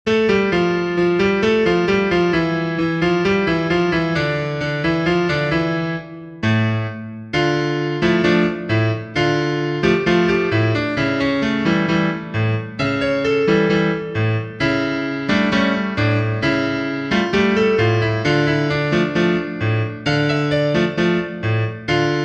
piano

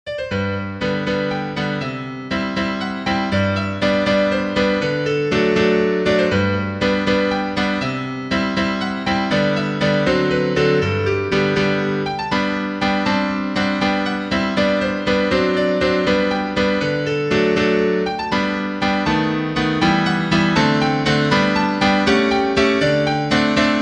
piano, sheet music

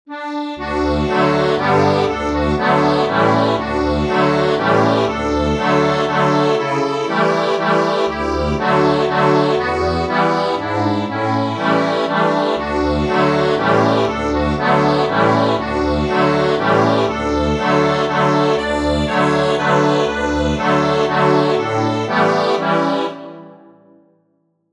I know that my redeemer lives, accordion

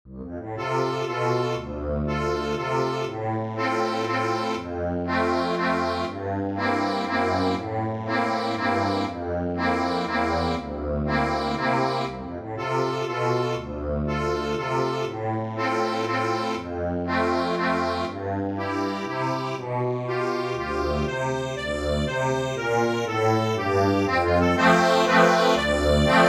ACCORDION

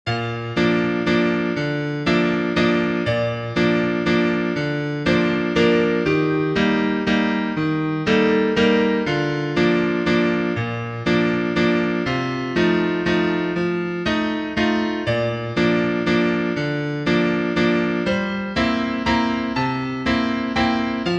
The Little Lift Girl for piano

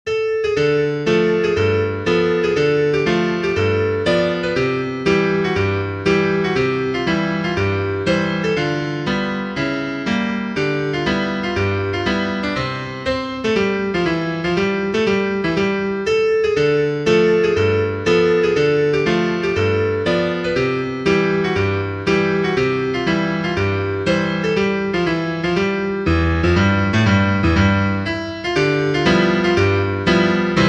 piano, sheet music

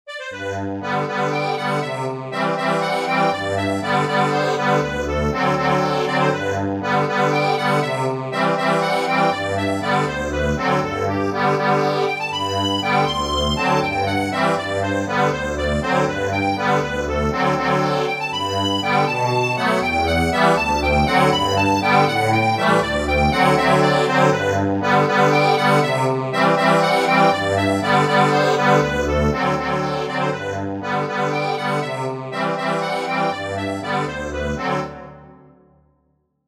ACCORDION

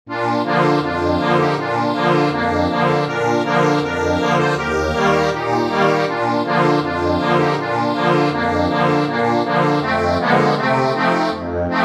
Bringing in the sheaves acc audio

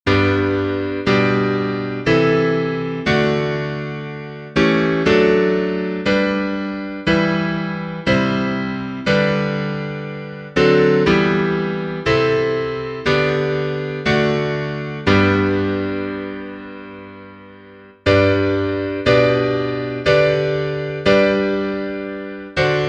God save the king for piano

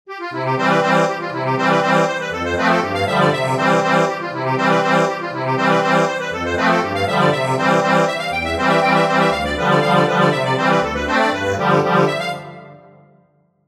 accordion

